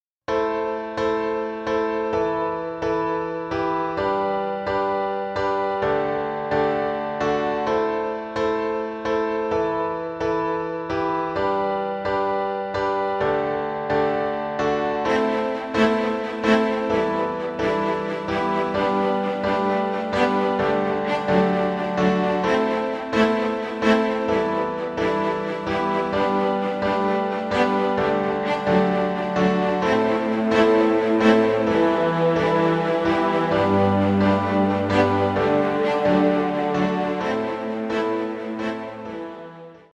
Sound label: Ambient Version